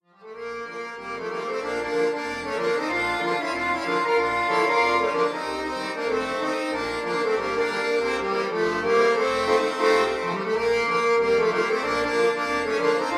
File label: American Patrol Playback